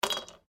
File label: Ice cubes Glass